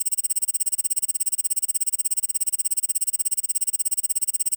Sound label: sparks count up loop; netent